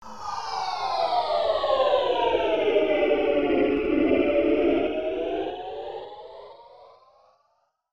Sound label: Flanger Doppler